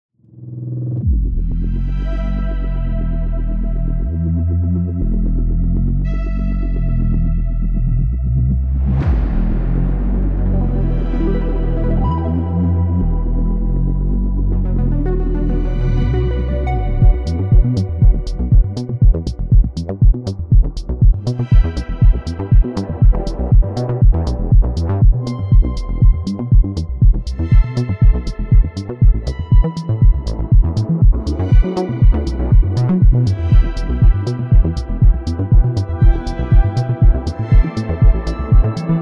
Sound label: trailer